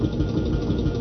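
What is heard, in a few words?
compressor loop, Train; OpenBVE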